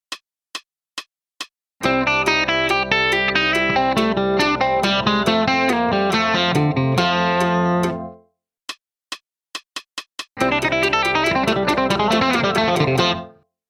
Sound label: lick